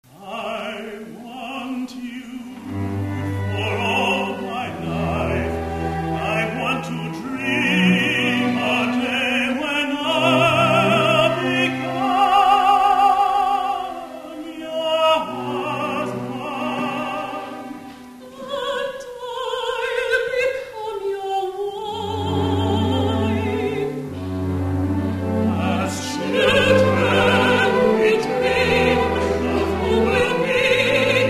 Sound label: duet